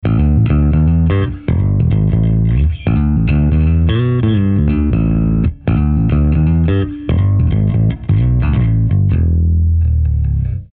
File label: Fingers Ibanez SR1205; Terror Bass 500 SP212